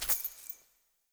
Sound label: Ur Coin Toss; UI; Minigames